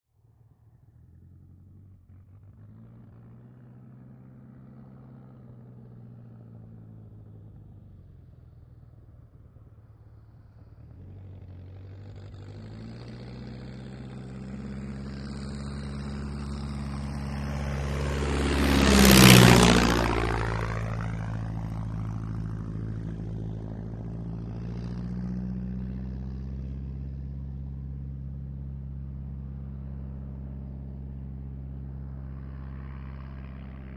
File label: D.H. 82 Tiger Moth. Grinding And Chattering Engine, Slow Approach And Away, Medium Growl With Flyby. Medium To Distant Perspective. Prop Plane.